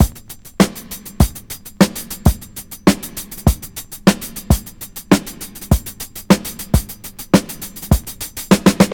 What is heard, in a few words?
134 bpm drum beat c key